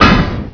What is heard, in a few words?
JUMP; win95